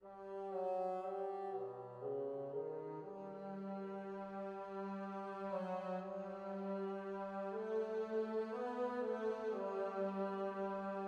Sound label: bass ambiance; GC track4